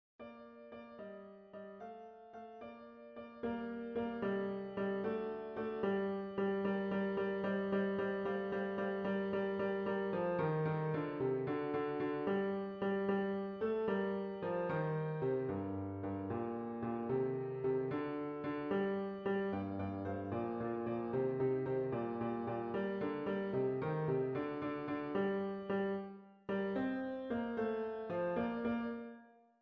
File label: Tarantella